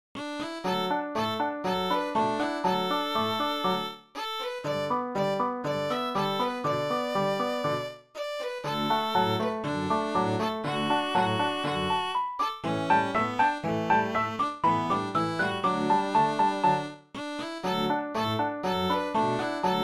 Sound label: Piano Trio